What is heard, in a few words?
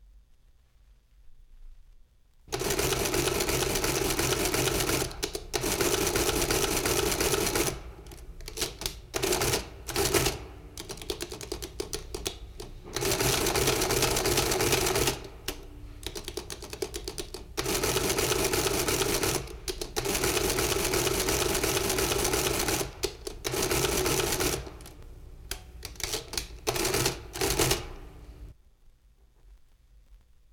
Calculator; Jac Holzman; Authentic Sound Effects Volume 2